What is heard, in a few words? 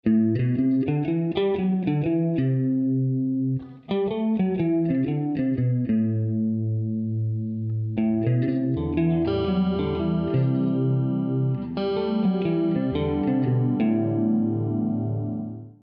Harp Dorian Licks; open string awareness; ragajunglism